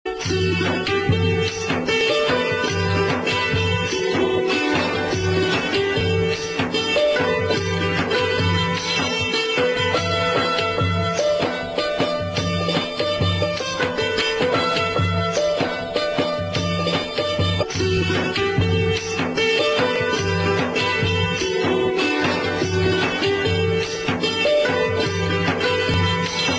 BG Full Music Instrumental; dharti ka veer yoddha prithviraj chauhan

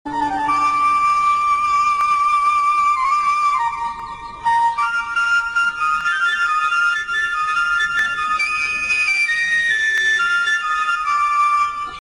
BG Flute Music; dharti ka veer yoddha prithviraj chauhan

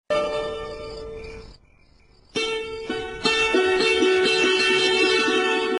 BG Music Short; dharti ka veer yoddha prithviraj chauhan

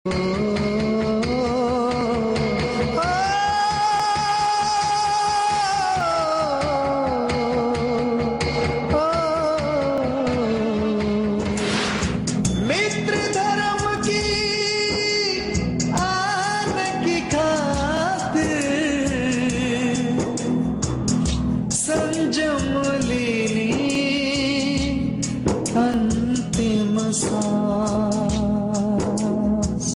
Short Tone Song; dharti ka veer yoddha prithviraj chauhan

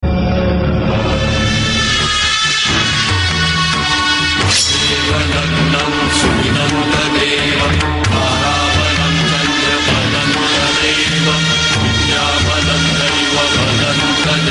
BG Tune Sad; dharti ka veer yoddha prithviraj chauhan

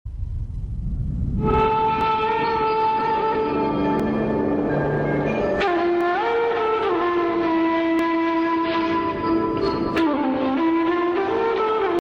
Flute; dharti ka veer yoddha prithviraj chauhan

